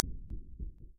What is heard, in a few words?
Running